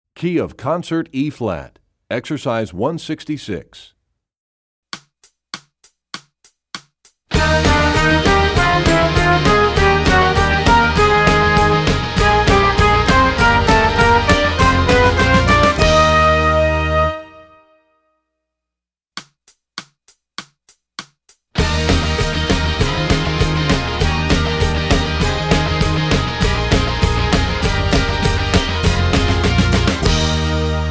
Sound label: Studies Concert Eb; EE2000 Book2; Rubank